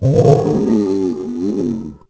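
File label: scream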